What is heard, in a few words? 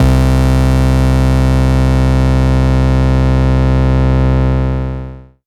Bass C, Offbeat Bass